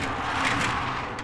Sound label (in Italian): porta scorrevole